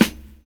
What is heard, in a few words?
SD, Drums Dance & Groove; USB Soundscan vol.11; AKAI